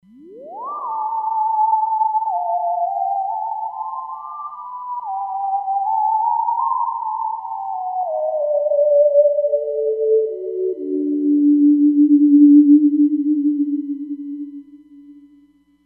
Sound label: Sine, Roland Fantom XR; Xtrem Sine